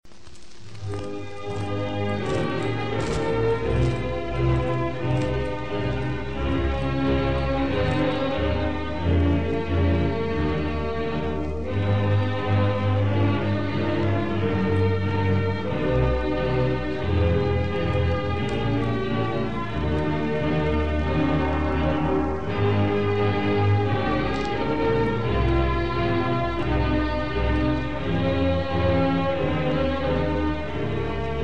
Pomp and Circumstance Op. 39 marche no. 1 en ré majeur